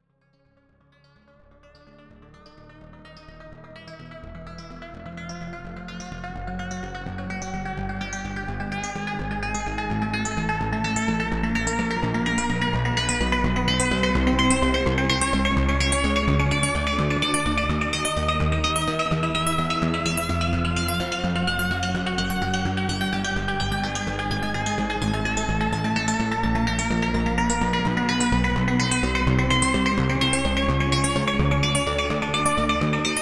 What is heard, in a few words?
Cluster Pluck Shepard; Zebra2